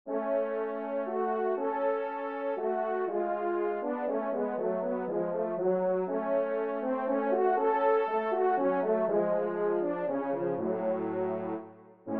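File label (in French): duo, ensemble, trompe cors; Kling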